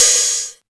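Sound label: DM5 Hat Open, Alesis DM5 drum machine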